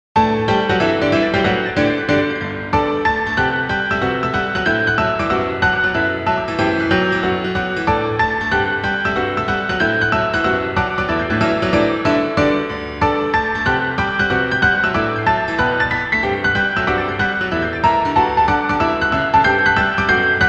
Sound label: Piano; BGM系統